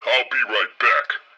pre reload; Blind Drive

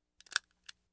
Household Phones

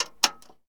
Plane P40 Lever Cowl Flap; Airplanes

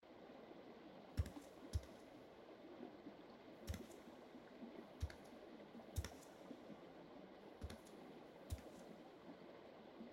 Lonely Manor Storage Room

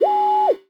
Meridian Scanner; Medical; Blastwave

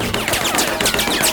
Bullet Ricochet Metal Loop; Destruction

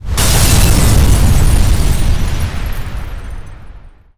Explo Large Glass; Destruction

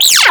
Arcade Alarm, Video Game Sounds; 1001 Sound Effects

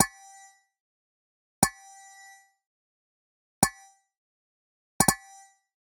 Digital Click Neutral; boom library; The Interface

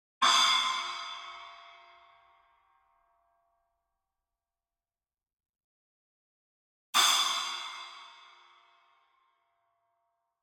CYMBAL Splash Hit Far; boom library; Cinematic Strikes Construction Kit

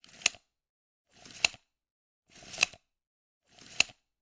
Generic Click Metal Small

